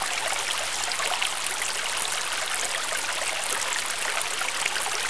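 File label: ambience fountain; Star Wars Knights Of The Old Republic II; XBOX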